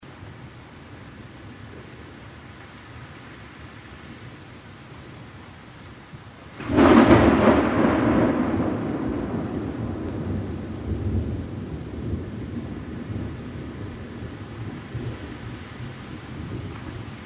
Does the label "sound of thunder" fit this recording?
Yes